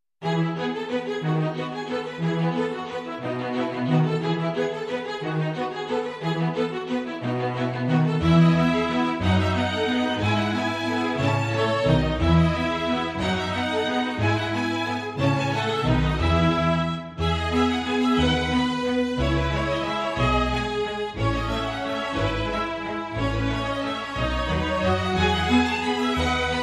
Minuet, Strings

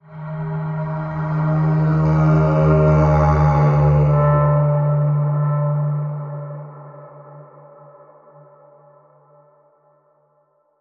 Beastly Throat Bend

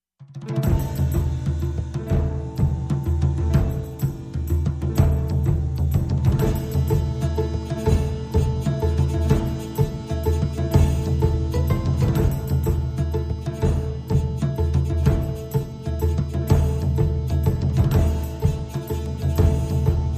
No Orchestra

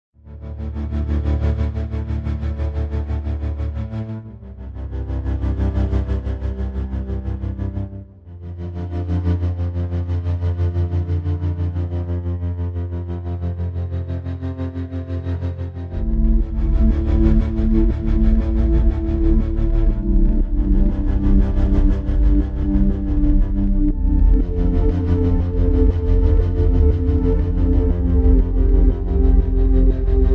Pulsing

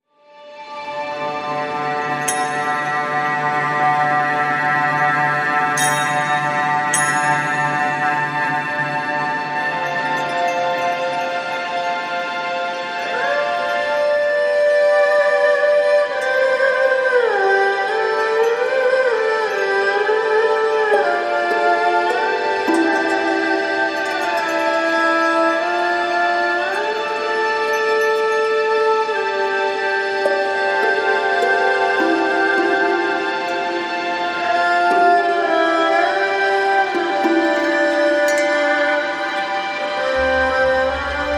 No Woodwinds

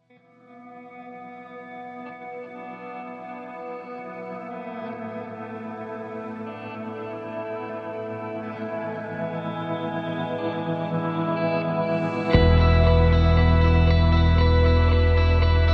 Procession, Guitars and Pads

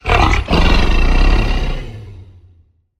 Warning Growl

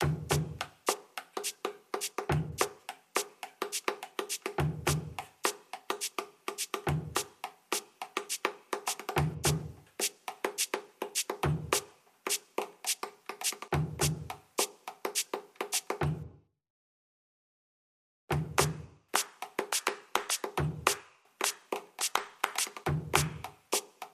Drums Only

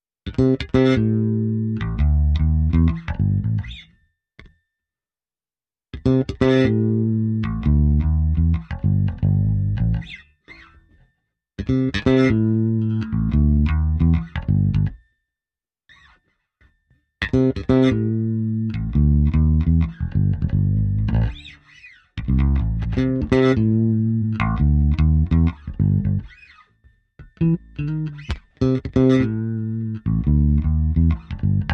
Bass Only

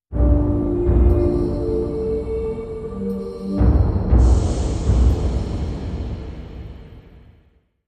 Beat Sting

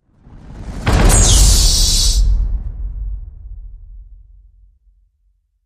Hit Hor Loose Compressor

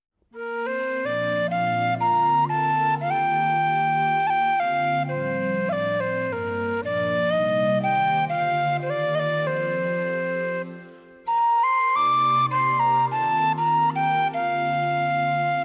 Tin Whistle Solo; 60sec

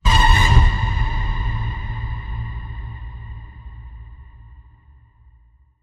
Ghostly Ping

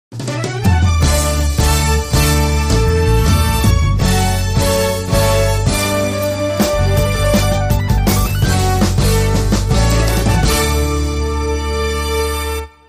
Dramatic Sig

